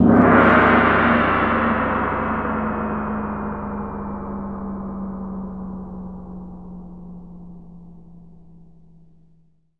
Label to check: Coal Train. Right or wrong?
Wrong